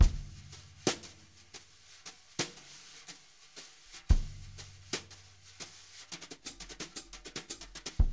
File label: jazz brush loops